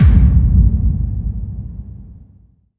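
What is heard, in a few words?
bass drum FX, dance drums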